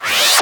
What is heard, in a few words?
Scratching FX